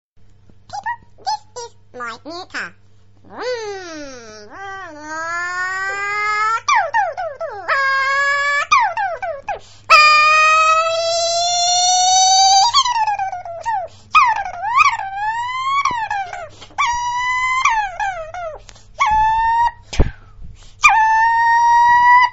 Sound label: Baby Talking Funny Little Kid Mimics Bike Race